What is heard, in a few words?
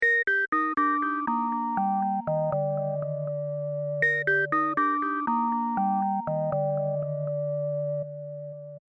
E Piano